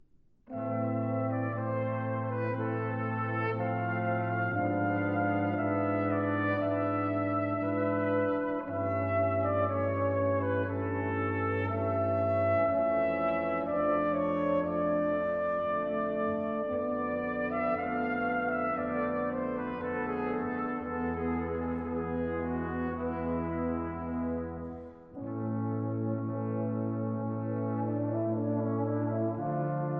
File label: Sonata In C For Trumpet & Strings Adagio, Brass Quintet; Baroque Brass